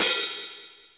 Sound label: roomy crash cymbal sound f sharp key